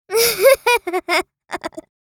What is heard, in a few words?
Girl child laughing